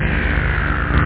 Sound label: HUM; da capo vol1; synth chords